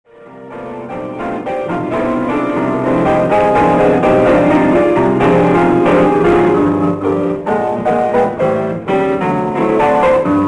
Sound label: crazy jazz